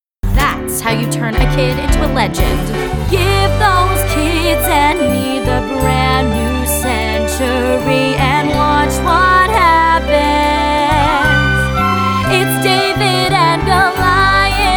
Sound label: Guided Vocals; camp show in a week audition materials